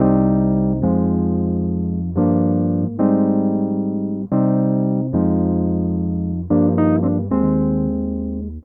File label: 111 C DISCO WURLI; 111 BPM